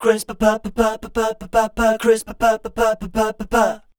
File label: scat, voice